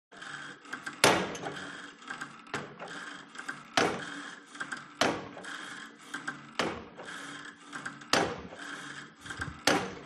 SPORT GYM Cable Machine Pull Release High Weight Sequence Fast